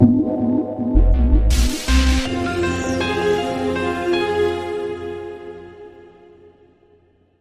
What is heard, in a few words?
Sonic Big Beat Short